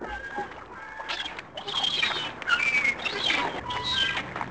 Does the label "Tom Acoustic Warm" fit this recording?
No